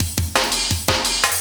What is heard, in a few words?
loop, Drum & Bass, 170 BPM